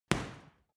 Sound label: boxing punching bag side hook hard; sport freizeit; boxen